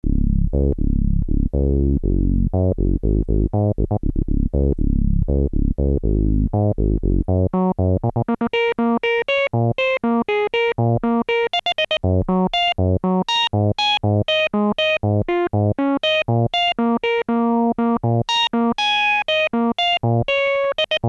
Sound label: ensoniq mirage dsk-8 filter demo lpf